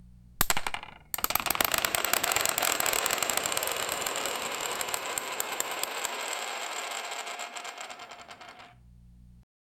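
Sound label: very loud roulette spinning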